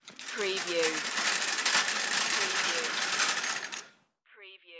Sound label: shopping cart